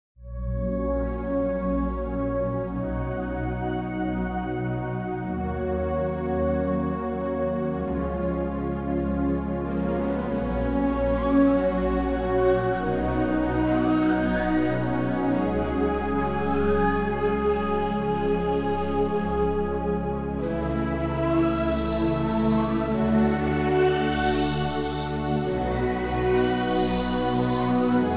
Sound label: pop2